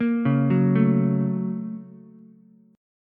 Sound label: Bb Minor Major 7th Sharp 5th Bb down Ukulele; BbmM7#5; arpeggiated; JGuitar